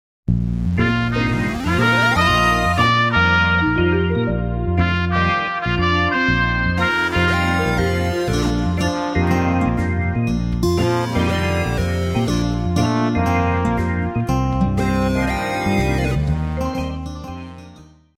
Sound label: Instrumental